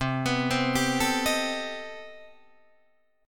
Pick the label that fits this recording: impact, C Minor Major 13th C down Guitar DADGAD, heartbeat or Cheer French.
C Minor Major 13th C down Guitar DADGAD